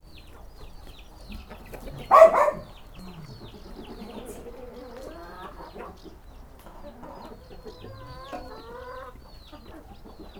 hajnali baromfiudvar, vegyes; parasztudvar

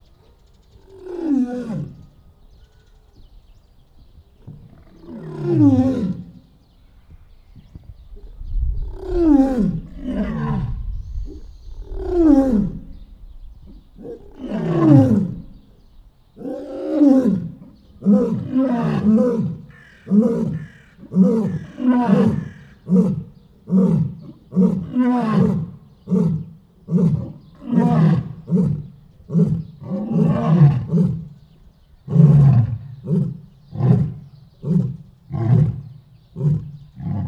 parban bombolnek, oroszlan; marantz puska; debreceni zoo 2012

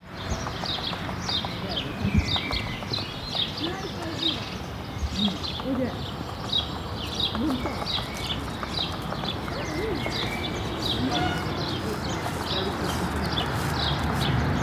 csőrével tisztogat, rózsás gödény; állathangok; nyíregyházi zoo 2010

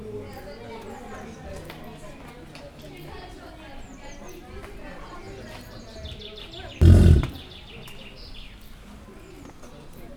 látogató is, amerikai bölény; pecsi zoo 2017

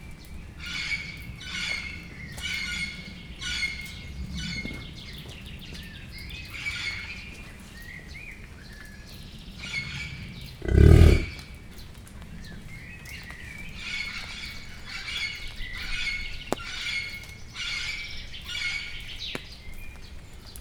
egyszer szólal meg esőcseppek, amerikai bölény; pecsi zoo 2017

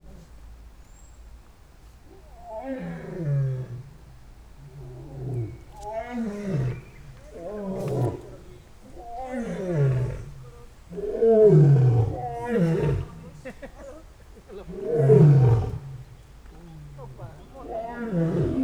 oroszlán bömbölés; szegedi zoo 2011

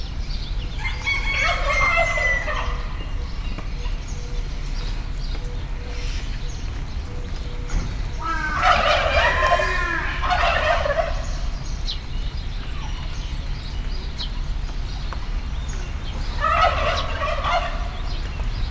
pulyka; állathangok; nyíregyházi zoo 2010; paraszt udvar

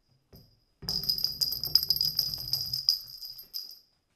balról gurul, csörgőlabda játék asztalon gurítás; Perkupa

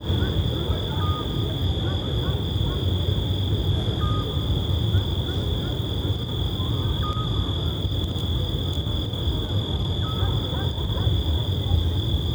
füleskuvik füttyentése kabócafélék, Zakynthos; termeszetben

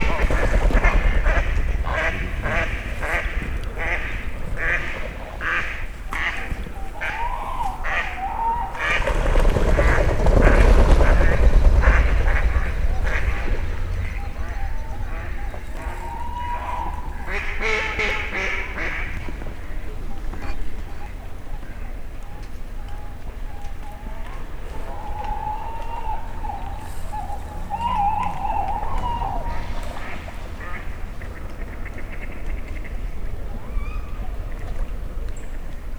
több felszálló réce, bagoly, tőkés réce; termeszetben; allathangok

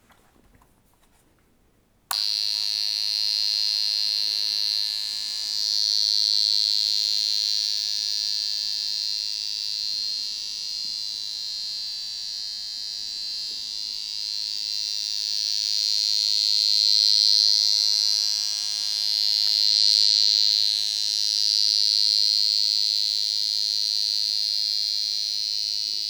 ide oda mozgatva; Perkupa; helyzetjelző

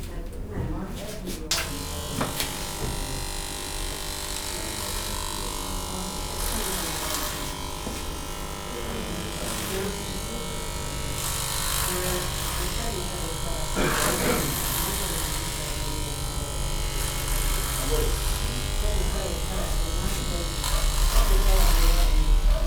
hajvagas geppel

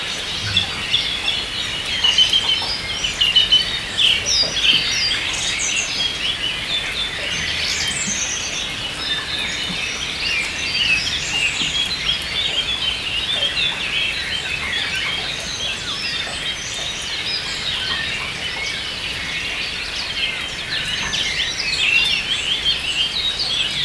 teraszalol halk horkolas, eso; vízház; cardioid

